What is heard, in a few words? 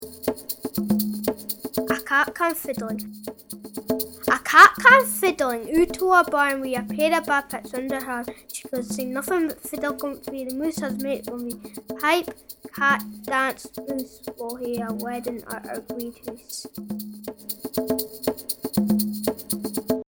backing